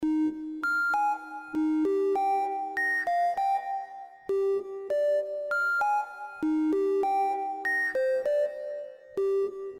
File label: backing